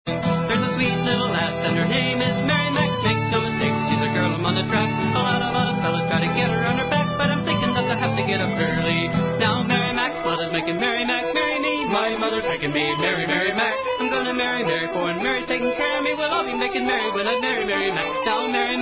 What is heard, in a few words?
low fi